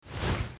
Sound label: Swhoosh; Gameplay